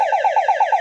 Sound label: amb2, siren